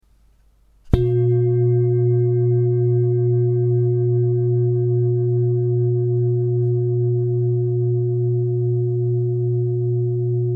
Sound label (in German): Klangschale